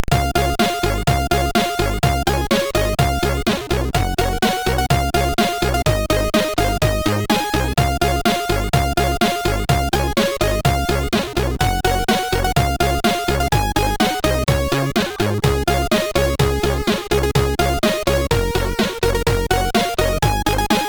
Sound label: Jingle Bells, SIDTunes